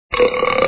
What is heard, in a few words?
burp